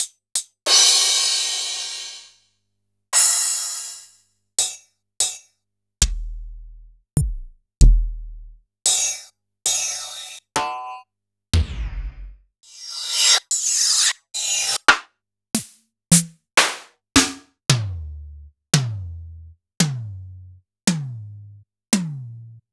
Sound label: Kurzweil Kit